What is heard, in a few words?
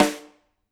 Rim, drum